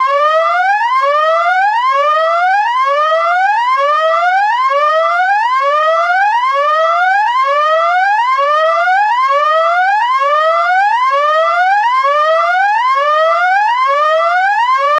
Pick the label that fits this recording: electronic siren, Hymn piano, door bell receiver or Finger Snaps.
electronic siren